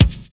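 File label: bass drum n shaker; Univers Sons - Kitch 70s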